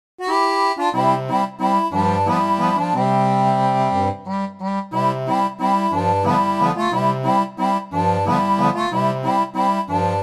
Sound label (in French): orgue barbarie